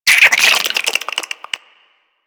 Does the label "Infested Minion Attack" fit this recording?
Yes